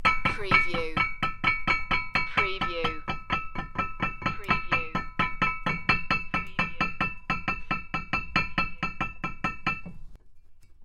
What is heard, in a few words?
Heavy Chain Clanking On Winch